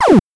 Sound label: Shoot